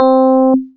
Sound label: yamaha tx81z new electro c4